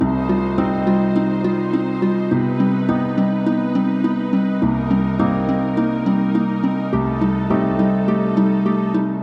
Orchestra Seq 104

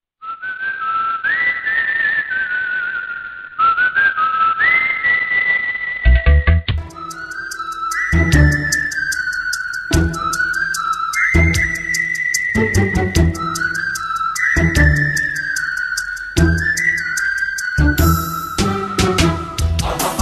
Whistle

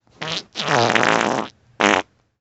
Morning Fart

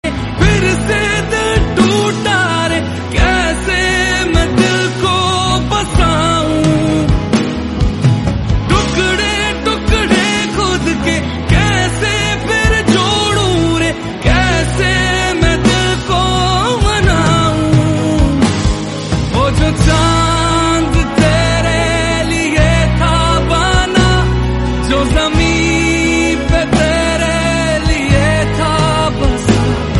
Hindi song

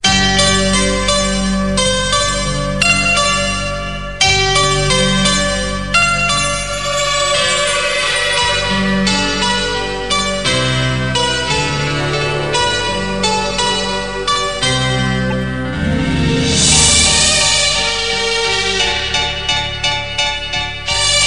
Theme Melody

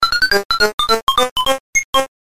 Dig Dug Sound

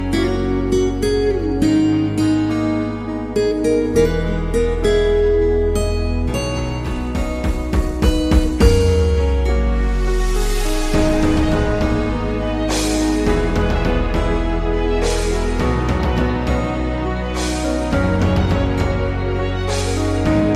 New Touching Tune

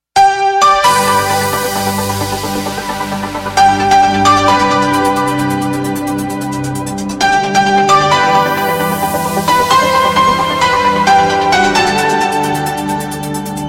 Piano

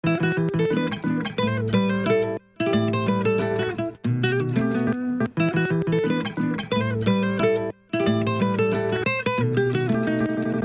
Spanish Guitar

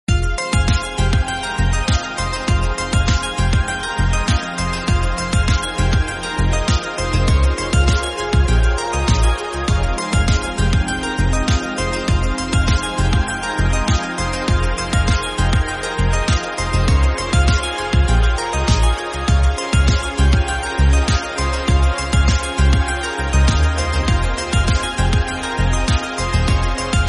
Sony Ericsson Ringtone

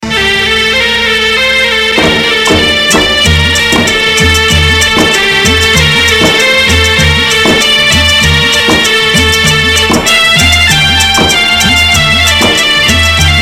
Snake Tone

